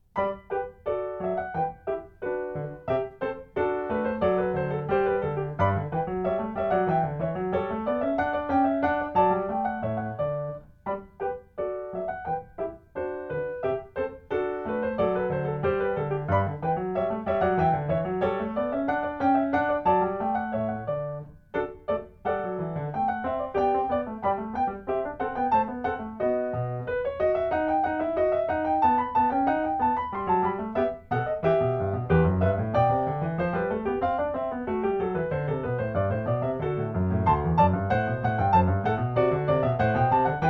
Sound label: Gavotte; Bach